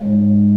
G#2, BOURDON PED, Organ

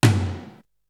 Tom, Drums; ZERO G - Datafile DISC 3

